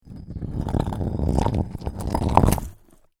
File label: Stone Scrape Drag Peak Towards End: A heavy rock slides down; A heavy rock slides down uneven rocky surface.